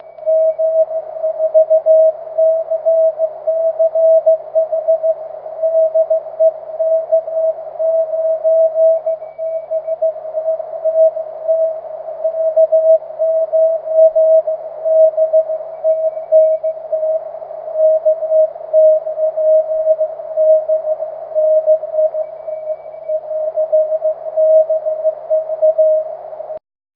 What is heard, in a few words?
cw; k9bct; 23cm